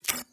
Drone Ability Error; TANK; 3Souls

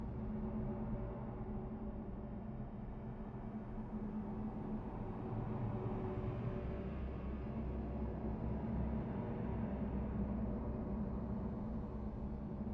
ambience room secret room base

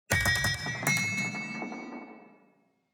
building upgrade stinger

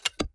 click card close

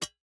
environment pickaxe hit iron

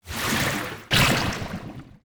water golem strike; Shelter

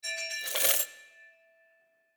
ui salary

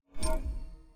mob spawn

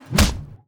blacksmith strike

